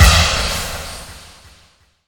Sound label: FX Boom